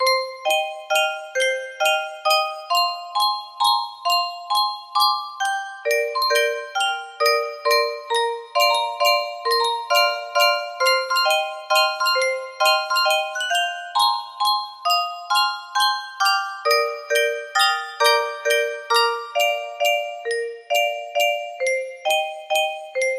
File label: in c minor, music box melody; hope grain coffee rotations